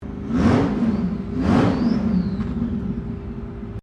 ENGINE CHEVY C5R 7LTR REVING DISTANT, Army recruiting vehicle; Trucks; Vehicles